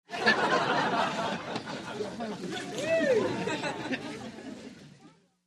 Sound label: Crowd Light Laughter, medium